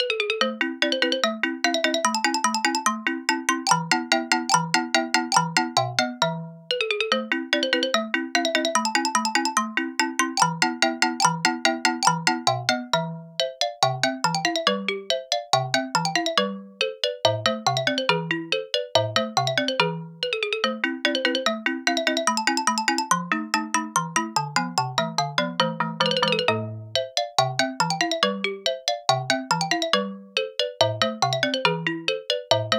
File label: xylophone